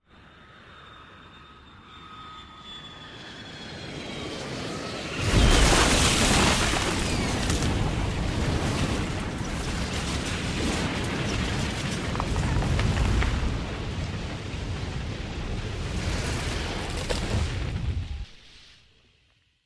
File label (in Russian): padenie samoleta v les